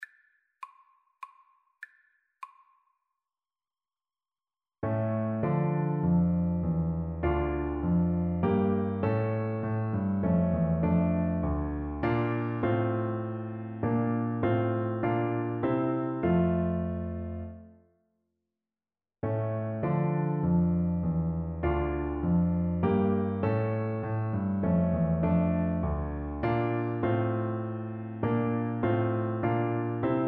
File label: Purcell Minuet, french horn